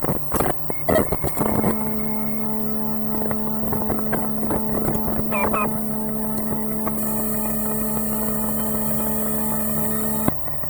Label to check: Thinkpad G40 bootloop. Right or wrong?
Right